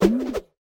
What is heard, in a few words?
sfx jump